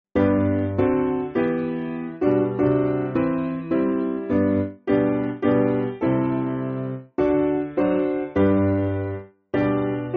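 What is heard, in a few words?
Piano, Music Backing Files for Churches; Evensong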